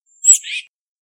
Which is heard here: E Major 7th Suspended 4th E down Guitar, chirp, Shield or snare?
chirp